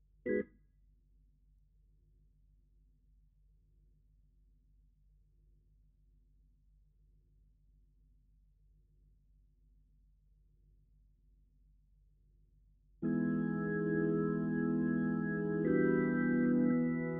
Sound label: Hammond